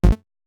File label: error